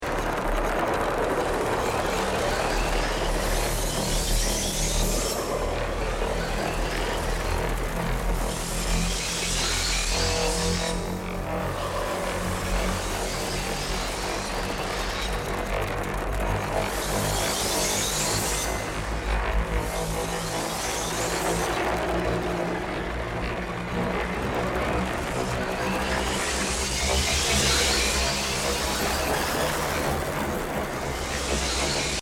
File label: Rising woody bass tone